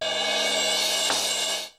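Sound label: RX5 crescendo,RX-5; Hollywood Edge; The Big Bad Beatmachine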